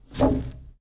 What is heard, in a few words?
Foosball Blocker Spin